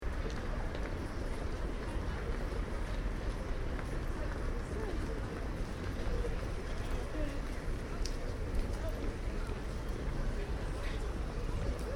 Town (Small) Ambience, People, Slight Traffic In Background; Outdoors; Backgrounds; Outdoor Sound Effects; Stuart Duffield